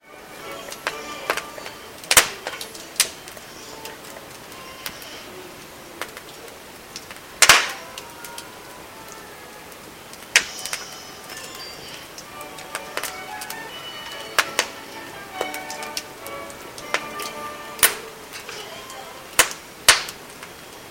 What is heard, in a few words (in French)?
au coin du feu